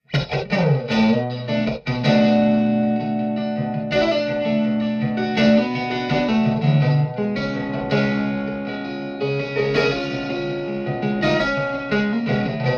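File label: subway play for tips electric guitar; The Sims 3; Windows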